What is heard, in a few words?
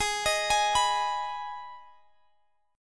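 Ab Minor chord, Guitar, Half Step Down tuning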